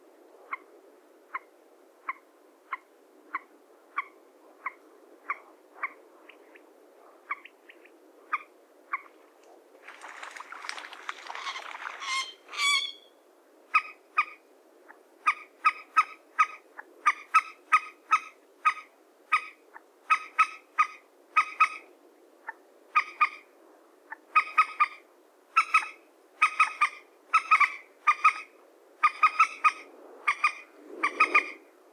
poule d'eau appel

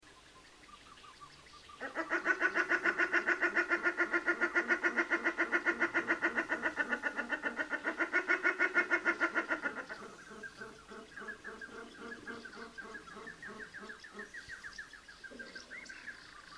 tadorne chant

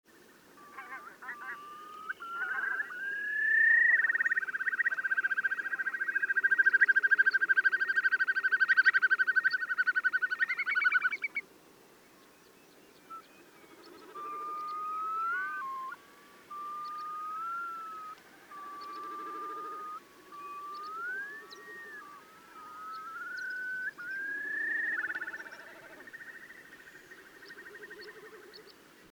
courlis chant

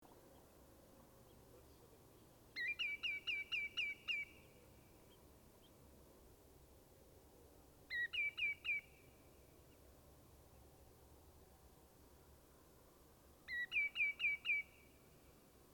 chevalier aboyeur chant

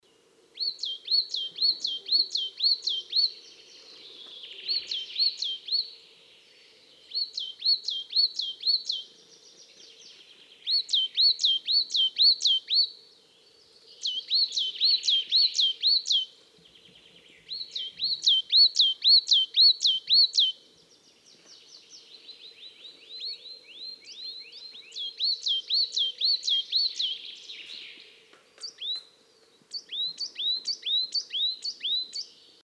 mesange noire appel

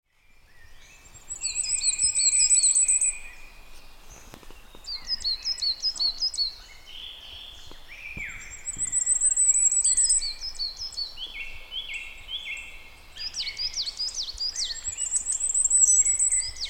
roitelet chant

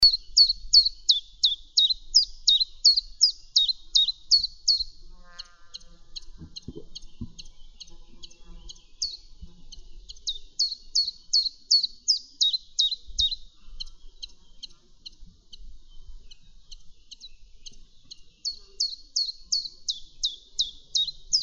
pouillot chant

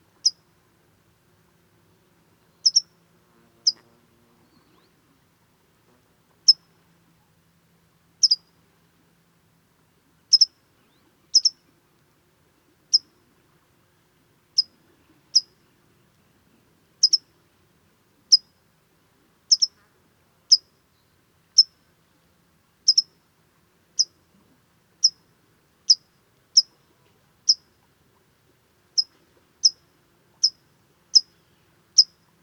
pipit appel

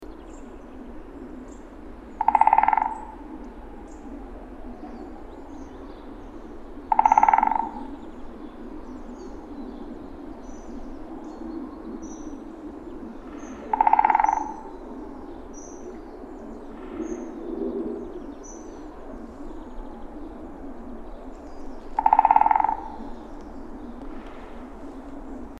pic epeiche tambour

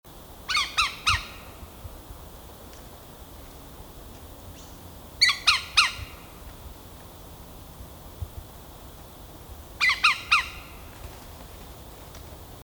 pic vert appel

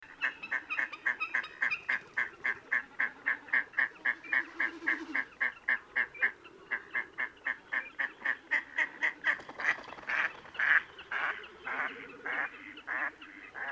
canard male

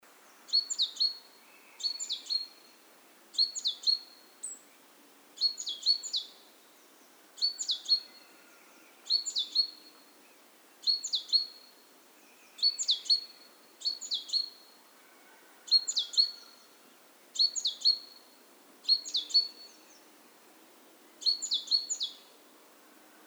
mesange charbonniere appel

